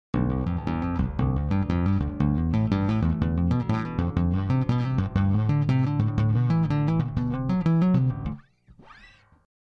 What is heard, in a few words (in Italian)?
Basso Shred